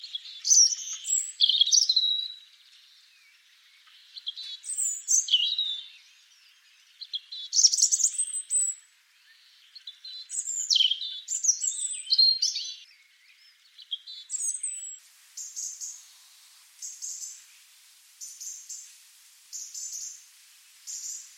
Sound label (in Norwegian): roedstrupe